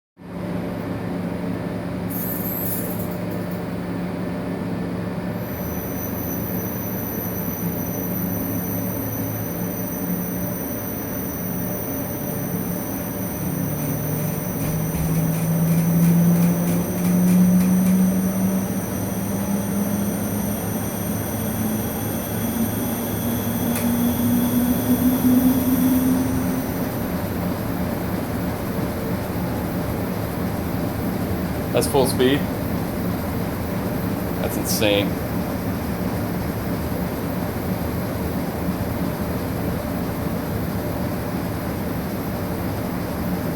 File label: philips ct 256 full speed